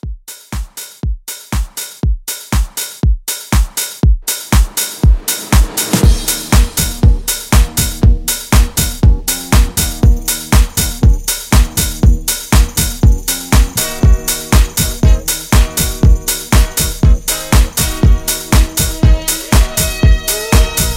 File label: 60s Soul House Re Drum 120